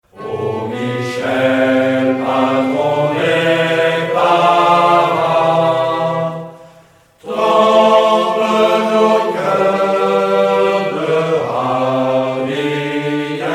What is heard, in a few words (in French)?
Hymne À Saint Michel